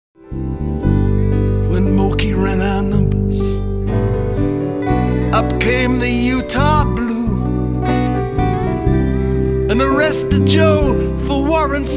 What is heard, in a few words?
Howling